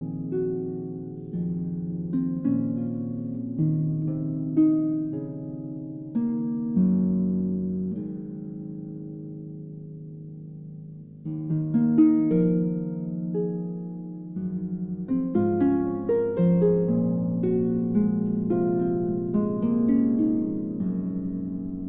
Harp Concerto Lullaby

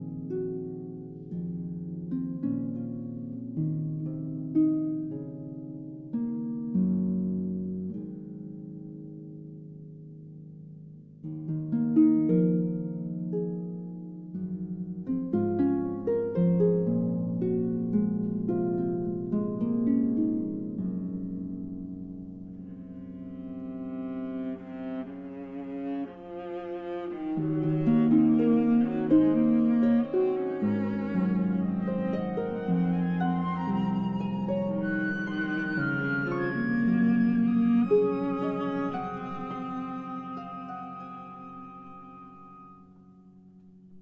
Harp Concerto Lullaby

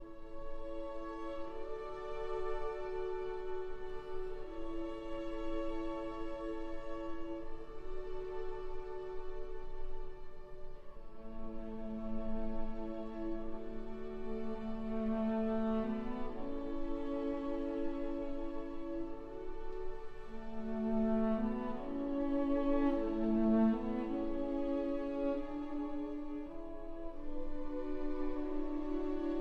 for cello and strings

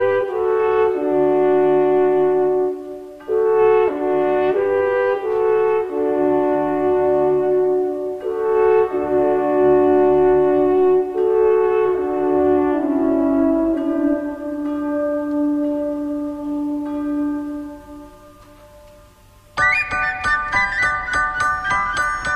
Folk Instrument Orchestra

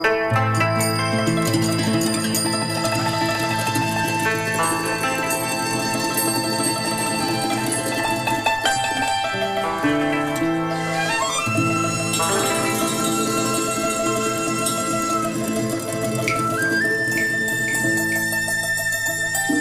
TRADITIONAL Chinese, Picking Flowers

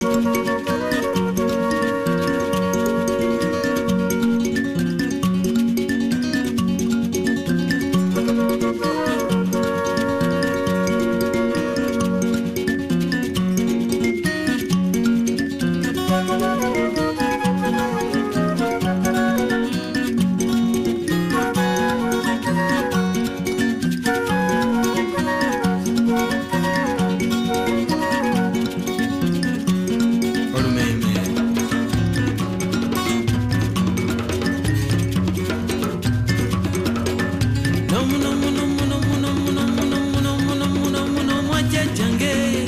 Traditional (Luganda)

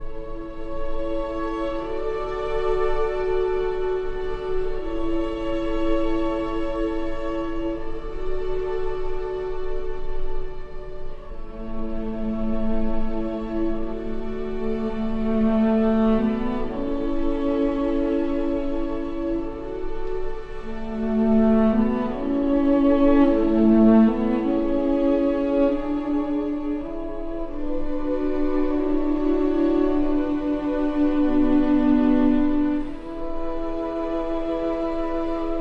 for cello and strings; Archi di Santa Cecilia

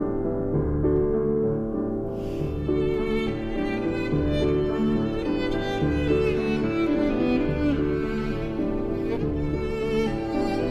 Violin and Piano in D

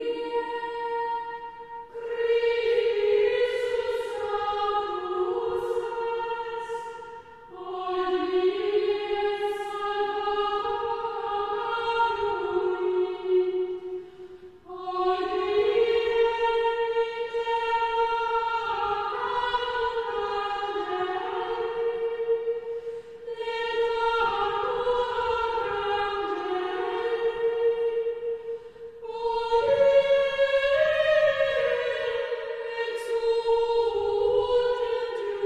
Chant Antiphon; Traditional; Chester Processional

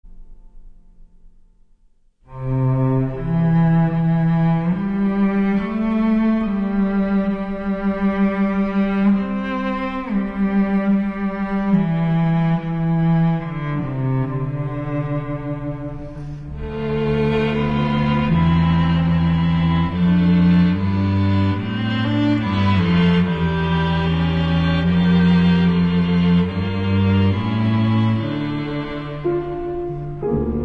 Piano Trio; Eaken Piano Trio; Hansel & Gretel- Evening Prayer

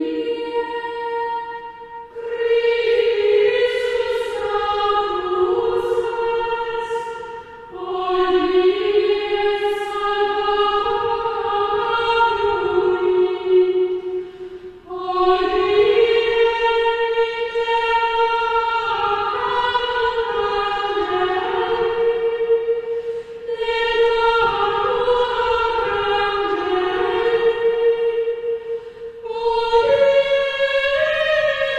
Choir Chant Antiphon from Chester Processional

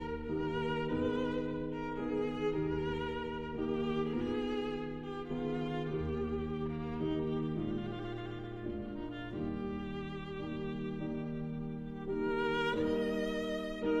Sicilienne for violin and piano

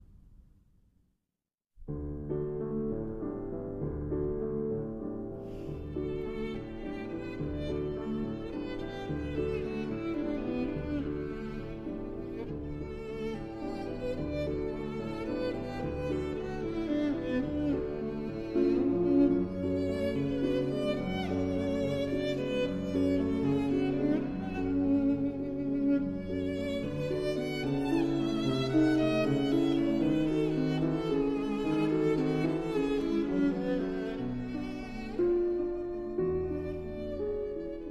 Violin and Piano in D

